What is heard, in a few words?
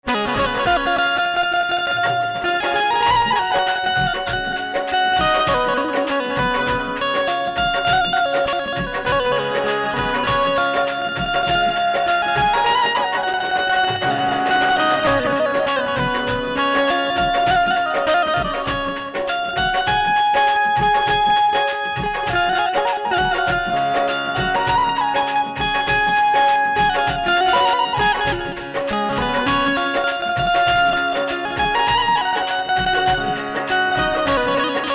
Banjo